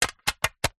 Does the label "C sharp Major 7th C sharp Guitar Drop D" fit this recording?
No